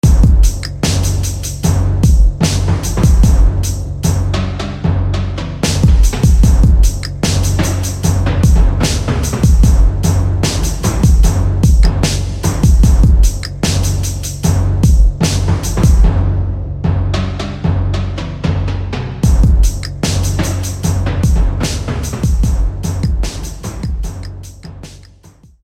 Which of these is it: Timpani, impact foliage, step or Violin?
Timpani